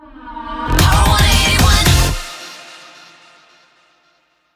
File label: Jingle Sting; Power 181